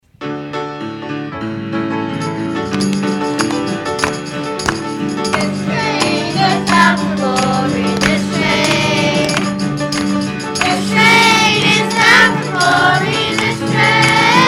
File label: jr choir